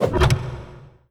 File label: new slot; ui